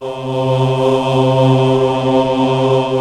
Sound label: CHOIR; B CHOIR